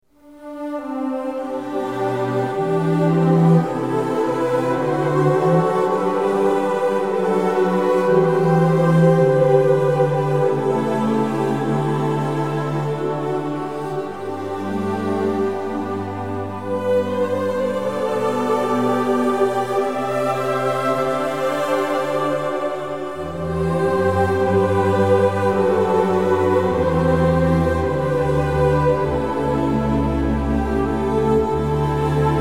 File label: Wilhelmus strings; Holland Happening